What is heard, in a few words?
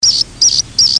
blackbird; offas mead